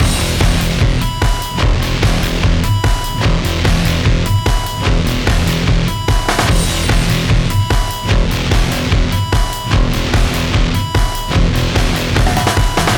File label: BGM ramen